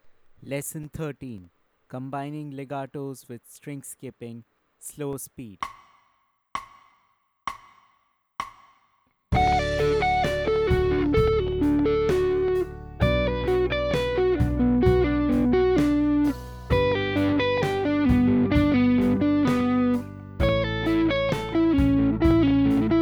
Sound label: Combining Legato and String Skipping Playthrough Slow Speed, lead guitar; The Art of Lead Guitar; Legatos and String Skipping